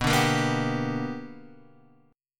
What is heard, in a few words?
B Minor Major 7th Sharp 5th, Guitar, Drop D